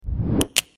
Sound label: whoosh snap